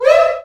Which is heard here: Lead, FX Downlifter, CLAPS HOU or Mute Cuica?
Mute Cuica